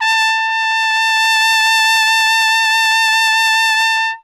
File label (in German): TRP WEICH, Alpine Volksmusik; Best Service